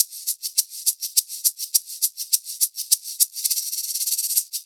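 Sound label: percussion, Roots Funk; USB Soundscan vol.41; AKAI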